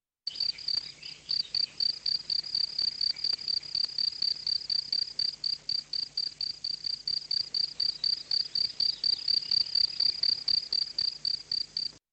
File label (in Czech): Cvrcek polni